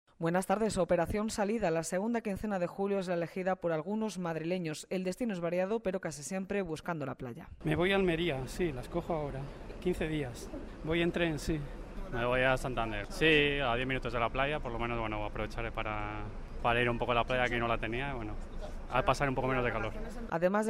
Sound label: beat